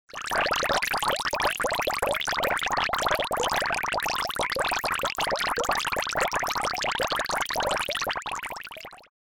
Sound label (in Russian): zvuki lopajushhihsja purkov na vode